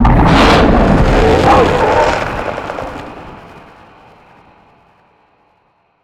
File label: JOVE pylon Destroyed